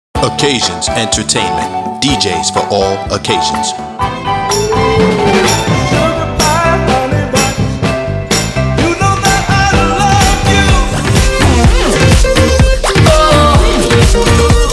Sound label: Music Sampler; Occasions Entertainment